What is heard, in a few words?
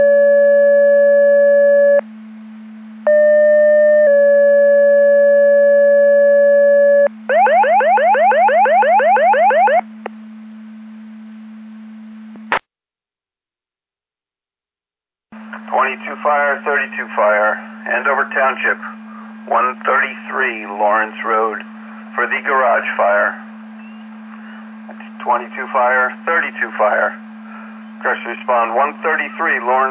Siren; Andover Twp Boro